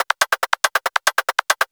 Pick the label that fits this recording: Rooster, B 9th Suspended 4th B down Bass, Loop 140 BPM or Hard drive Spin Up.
Loop 140 BPM